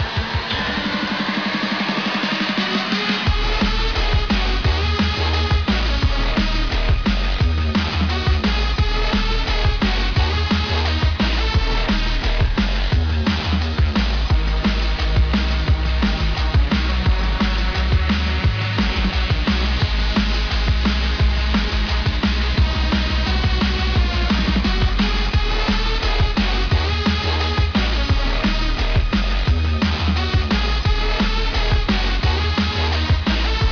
music

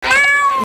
maouw; kz ufb spongebob

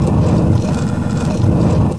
rock door; kza lagster

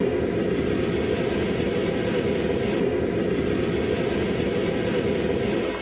Ballon; speedmountainez

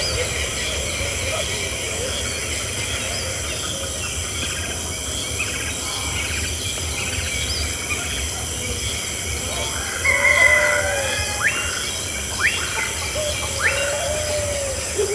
jungle; de junglia

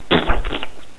die

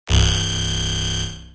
laser

